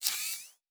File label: Door, Sci-Fi Sounds